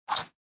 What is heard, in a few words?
Chomp Sound; sushi cat